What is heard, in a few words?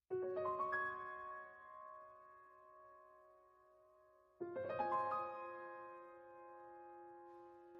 pause loop; osu skins; ruruichi tekkito kita the rock